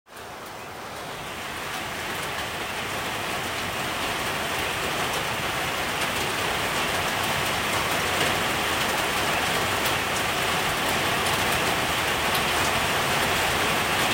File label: heavy rain on steel roof